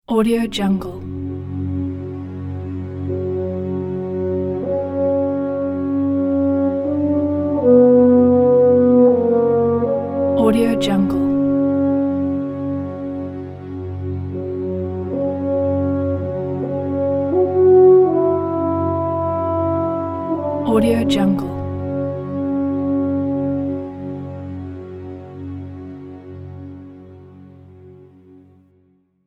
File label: mourning requiem tag ident